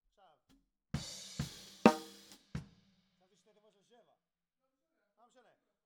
Snare Top

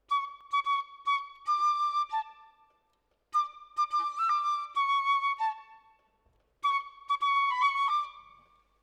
Orchestra, Jazz for piano

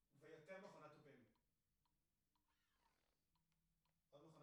TB GTR

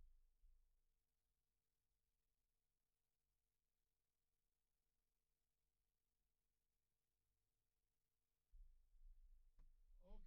Kick In

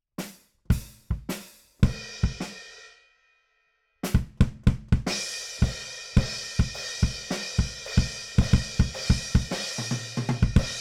BD Out

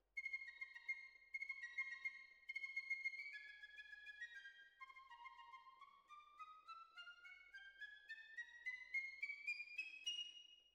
Cellos Over

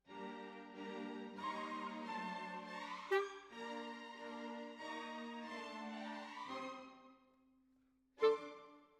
Clarinet

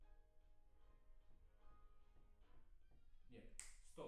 PIANO

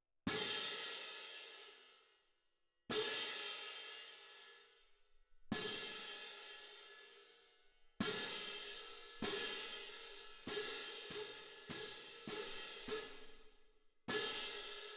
Cymb1 Main

